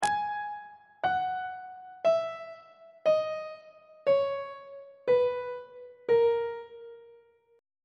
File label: b flat locrian mode descending on treble clef